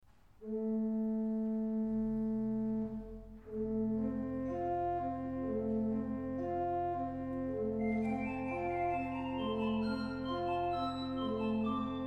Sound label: organ